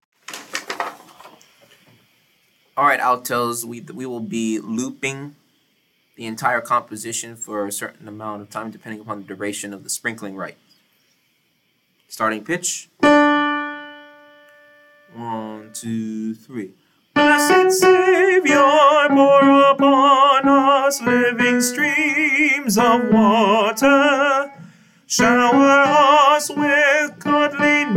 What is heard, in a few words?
Alto; Chamber Choir